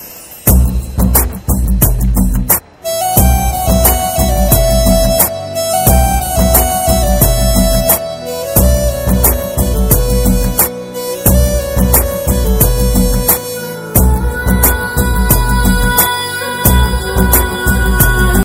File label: Tamil love ring tone